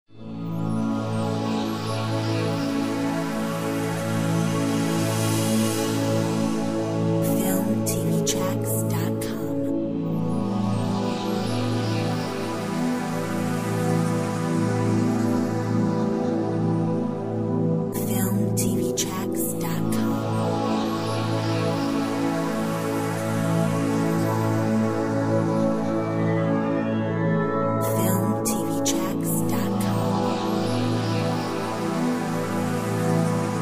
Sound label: Atmospheric harmonics